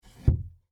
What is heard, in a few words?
Door Latch Close, Foley, Wood, Door, Latch, Close, Small; Latches; Sound Effects:Objects